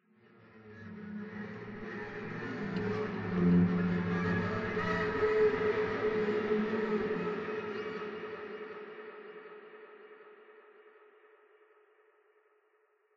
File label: Interior Reverses